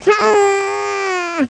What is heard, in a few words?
hurrrrrrrgh; DS; ML BOWSERSINSIDE; Yoshi (unused) (from Mario & Luigi Partners in Time)